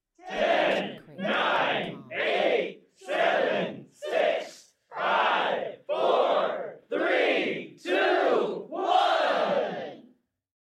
countdown; SoundsCrate

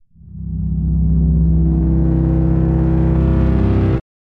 low synth swell; soundscrate

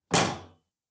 Metal Footfall; soundscrate

